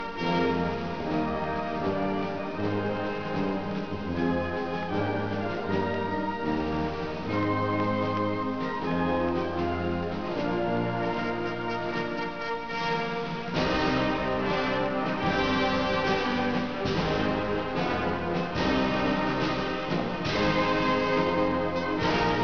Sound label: Hymn